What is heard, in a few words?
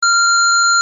Beep; Beep 10 - Highest; Museum Alarm Options